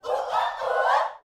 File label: SHOUTS, Gospel; Spectrasonics; Vocal Planet Vol.1 Gospel; akai; UYC SHOUTS